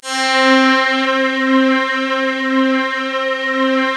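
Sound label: synth, Korg DSS-1, C3; ProSamples vol.55 - Retro Sampler; Crocketts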